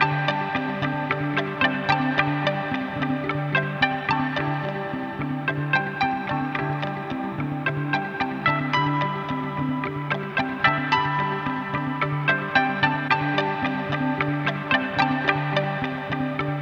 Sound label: China town, Loop